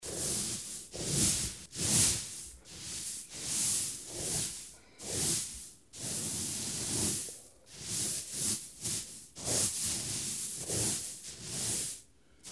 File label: ogakuzu gasagasa